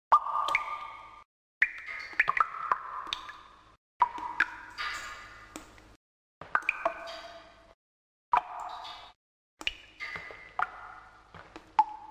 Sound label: Water Drops; five nights at warios